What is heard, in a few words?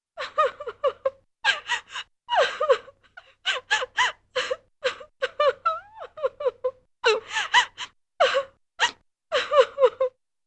Woman Crying - Unhappy, Cartoon; Hollywood Edge; Cartoon Trax